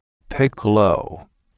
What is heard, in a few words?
piccolo